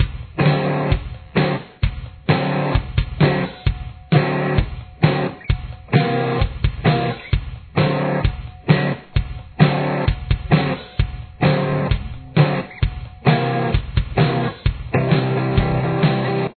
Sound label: guitar bridge